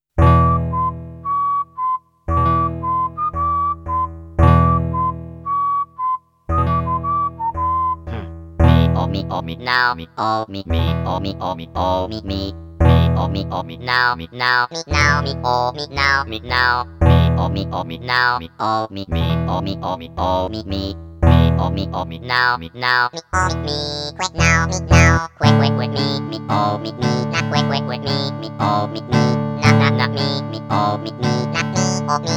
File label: Irish Song; animal forest totakeke music 2006